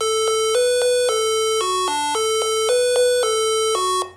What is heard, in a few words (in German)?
Backe backe Kuchen